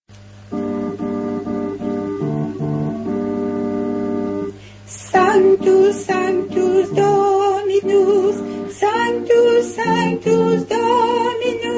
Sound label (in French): messe sanctus; messe de san lorenzo al595